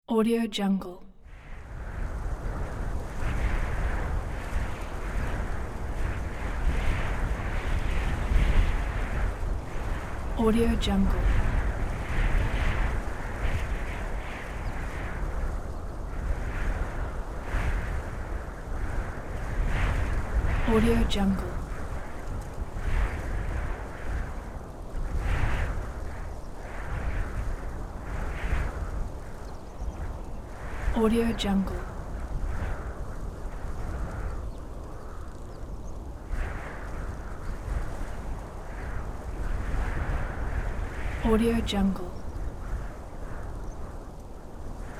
wind gust

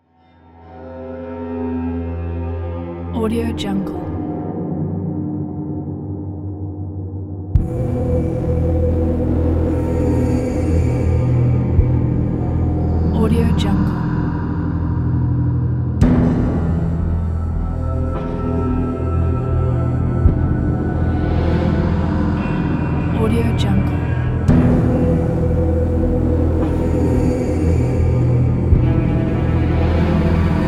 industry

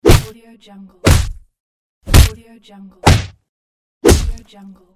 fisticuff punches

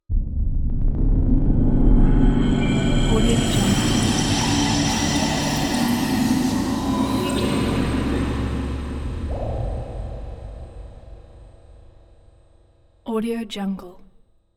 hybrid trailer ambient drone deep

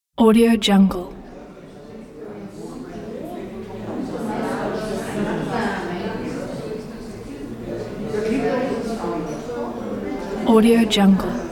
crowd talking in theatre hall ambiance

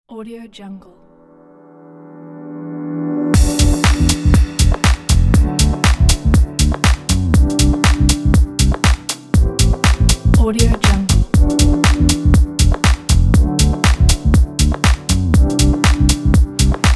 background chillout

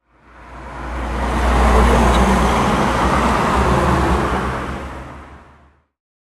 cars pass by

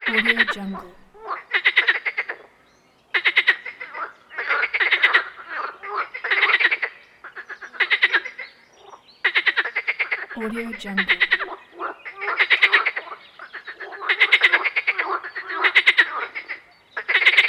frogs

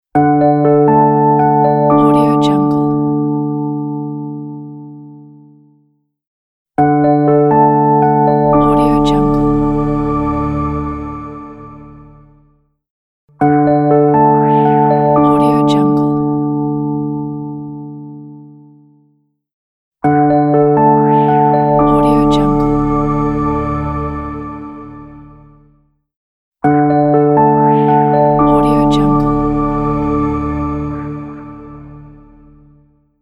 clean elegant logo

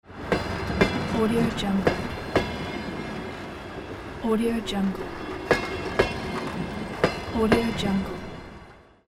train sound

